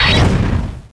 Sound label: cry; source of the sovereign